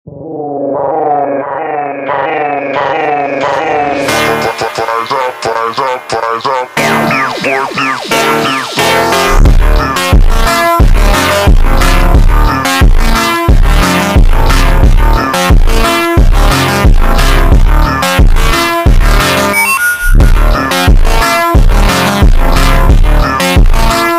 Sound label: Montagem ultra Slowed